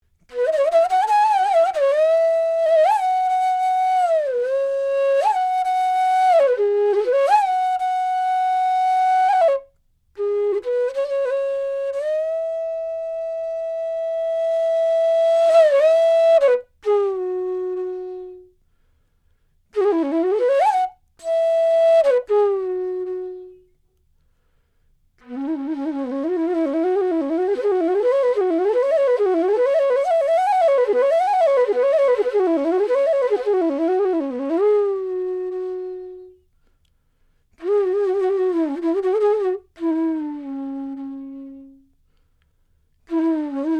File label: Bansuri bass flute solo